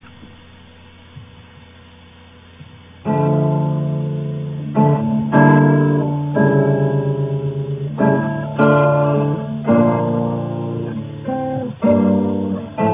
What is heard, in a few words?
chaconne, guitar